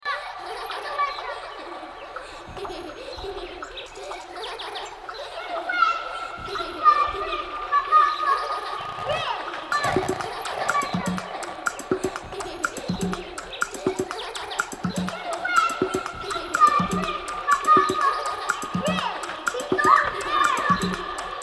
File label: Techno